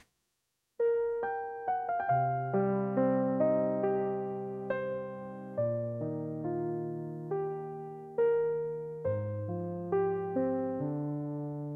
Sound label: lullaby, name songs; koa